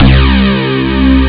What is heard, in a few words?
moog like; jazz jackrabbit 2